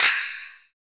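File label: PER PROCU, Procussion, percussion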